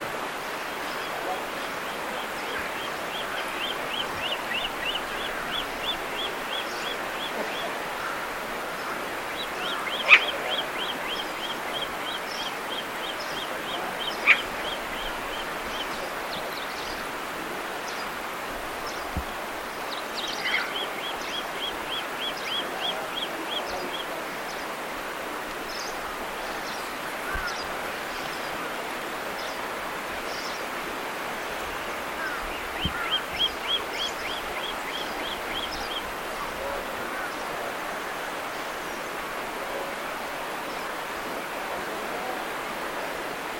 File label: Bearded Vulture